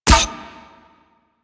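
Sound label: Full Draw Mech, Weapons; Warframe; Infested; Inf Cernos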